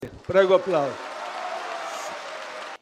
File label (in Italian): applauso; prego